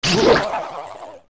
Bubble Die; zelda; OOT

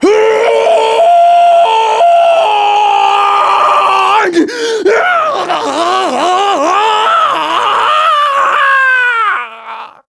Diving; Groose sky-diving, grabbing onto Link; Skyward Sword

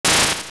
Spark; An electric spark hitting something; Zelda

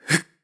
Practice Shield; Link; Skyward Sword; zelda

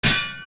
sword clang; OOT

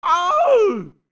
Oww; Mikau: "Oww!!" (also used by ???, the hand at Stock Pot Inn)

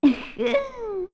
Laugh; Honey

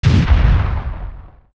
Pound; Ganondorf

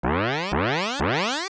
Frog Grow

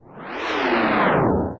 Shake; Morpha

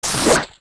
Skulltula Hit; zelda; OOT

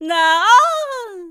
Relieved; Oren relieved (also used when summoning the Triforce of Courage); zelda

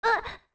Whine; Cremia whining

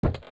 Steps Bridge; zelda; OOT

